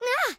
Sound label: bump; talking tom gold run gamerip 2016; Angela